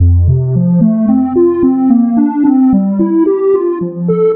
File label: Glass Bells, Trip-Hop Loops; Sonic Foundry (Sony Creative Software) - Rondo Brothers Trip-Hop Loops & Samples; Apologists